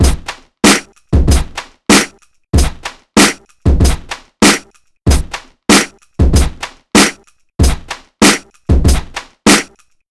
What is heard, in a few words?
95 bpm drum beat f sharp key